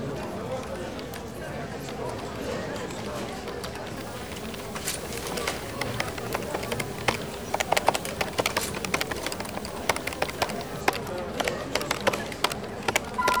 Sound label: office ambience